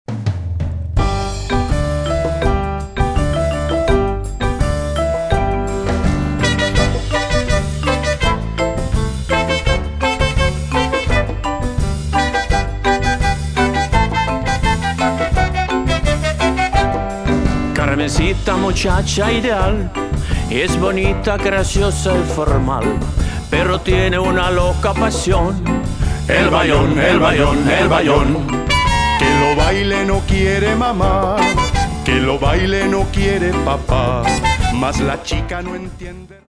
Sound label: Latin Dance